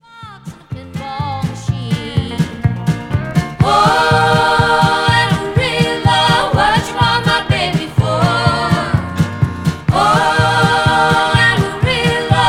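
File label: country female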